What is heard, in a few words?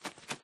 fly, parrot; Minecraft